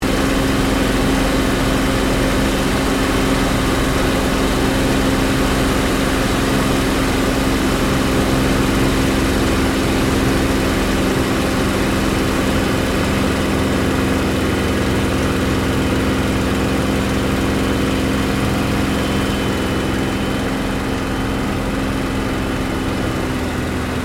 AIRCRAFT SUPERMARINE SPITFIRE MK-VIII APPROACH INTERIOR; Airplanes; Airplane Sound Effects